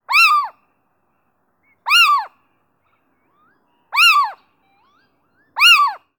steenuil

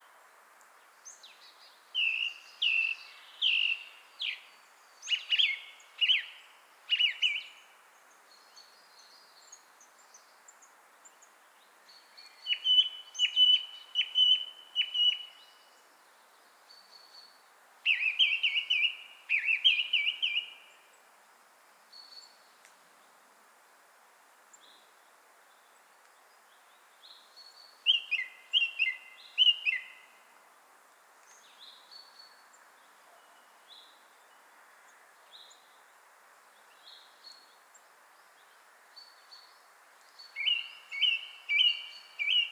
Zanglijster Turdus philomelos

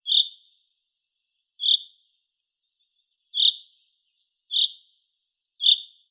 Vink regenroep; Vna

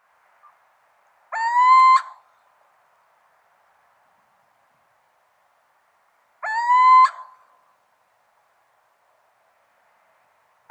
Steenuil Athene noctua vidalii

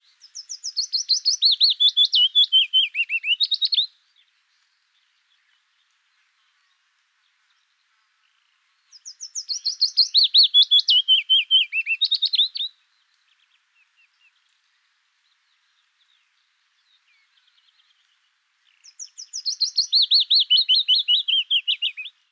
fitis

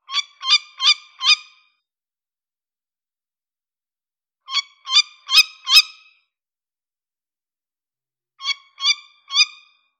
Bosuil

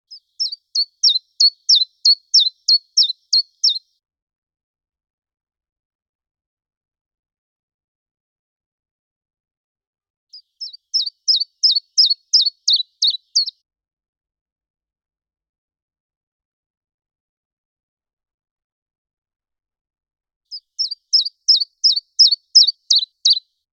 Tjiftjaf; Gaast

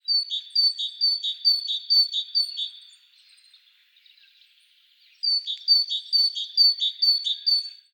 koolmees

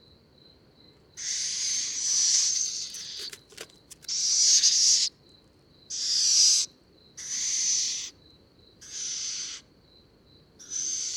Steenuil Athene noctua

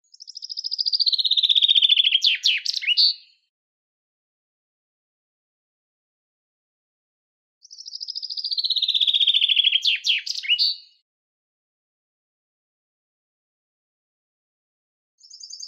Vink dalend loopje in zang

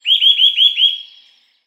boomklever

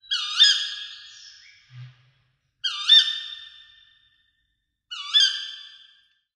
Bosuil Roep Contact Nederland

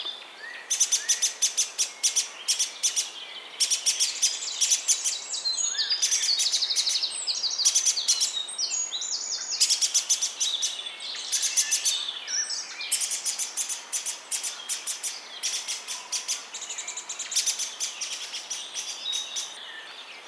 Zanglijster Turdus philomelos

